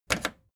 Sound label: record player play click fast